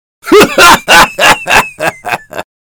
laugh sniper; fortnite emotes

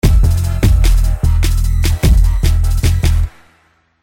emote make it rain music; fortnite emotes; athena

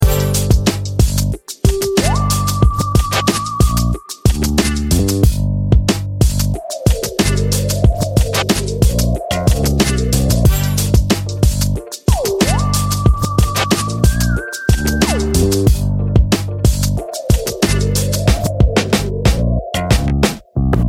emote hot music; fortnite emotes; athena

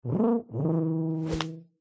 emote facepalm foley; fortnite emotes; athena

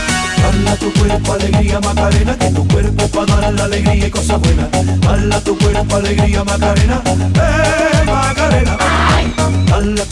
emote macaroon music; fortnite emotes

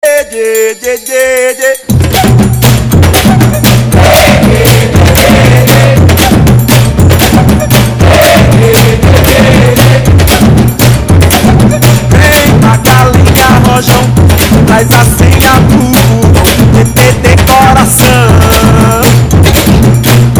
samba; fortnite emotes

